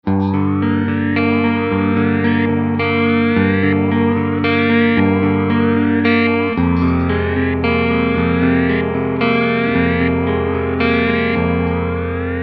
quadrature, mobius

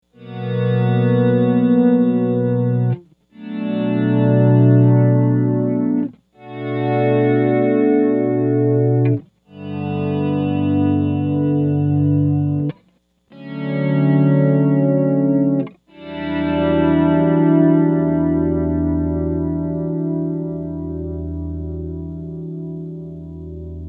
autoswell 28.7 bpm; mobius

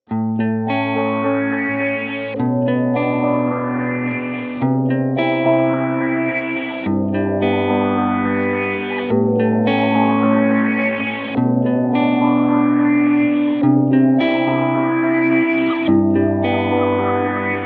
filter ramp

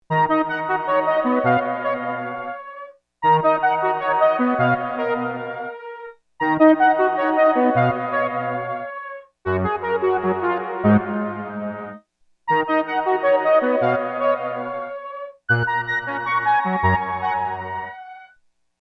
nonlinear, roland juno 6; BigSky

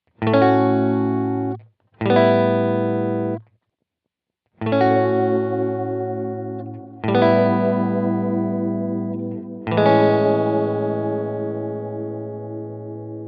oil can delay

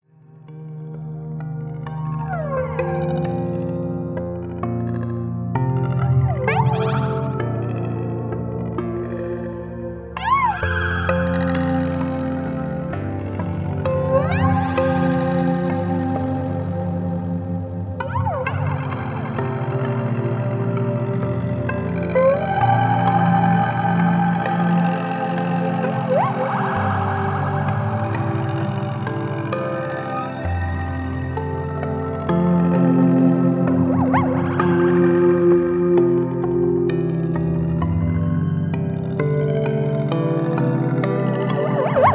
Stereo Shimmer Speed Feedback Decay; StarLab

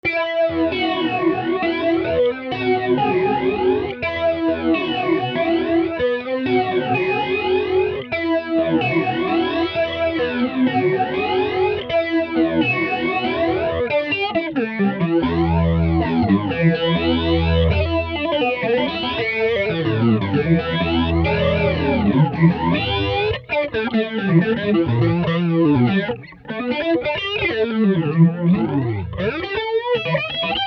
phaser; mobius

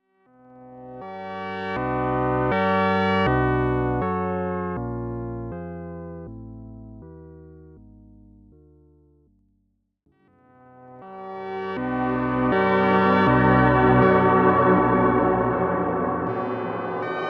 hall, yamaha dx21; BigSky

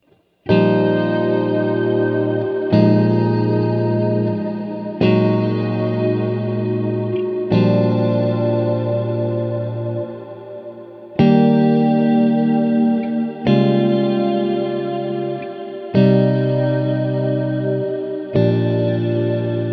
swell; BigSky; reverb; Canvas

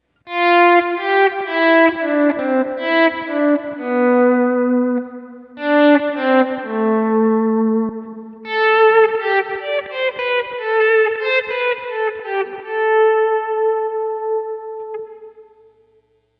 swell; BigSky; reverb; Volumes Up

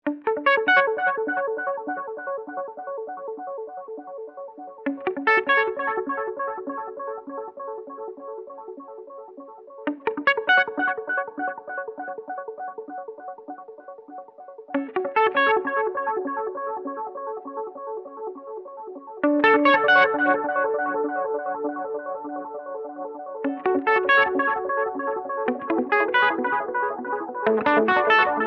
Max Delay Time

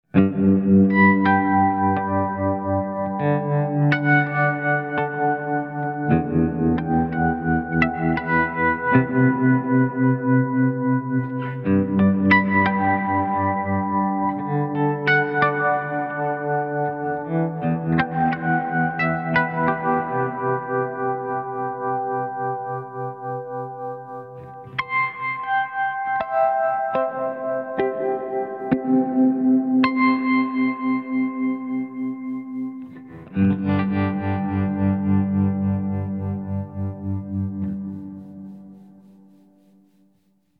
Waterfall; Strymon Compadre → Strymon Iridium → Strymon Flint

